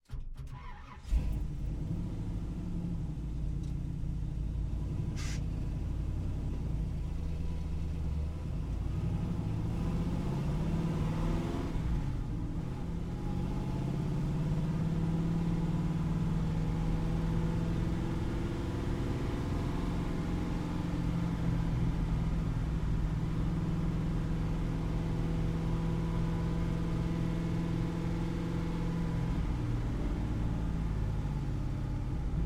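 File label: Pontiac, Firebird, Onboard, Medium, Drive, Various, Interior; 1969; AMBEO3; Pole Position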